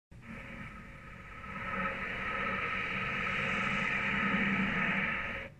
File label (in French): Son de la Triumph jaune